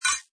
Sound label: Kettley, Button sound; Button Sound Effects; Multimedia; Lynne Music